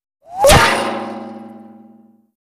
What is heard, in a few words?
Bullet with Whir Impa, metal